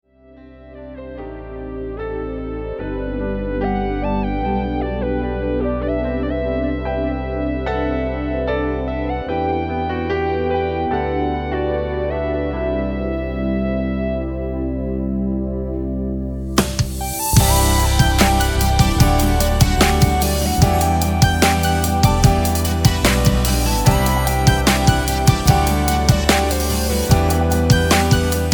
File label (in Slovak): klavesy